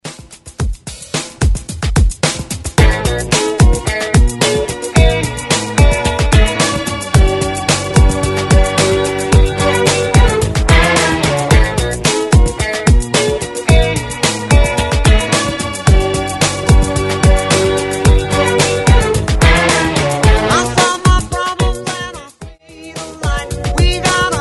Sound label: 70s redrums clean; hotwire